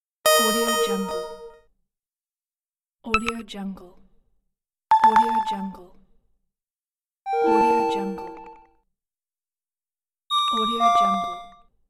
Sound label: mobile notification elements